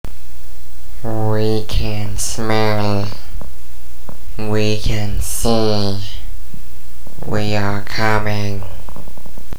alien; svencoop; vger

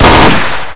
ber93r burst, weapons; firearms

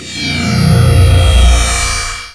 teleport ready

